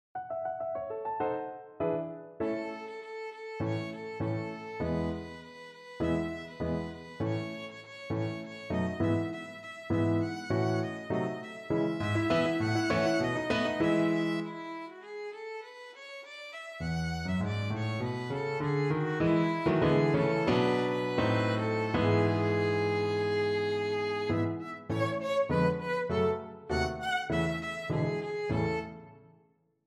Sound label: schumann fortune teller op.31 2 violin